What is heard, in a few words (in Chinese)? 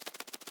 鹦鹉飞行声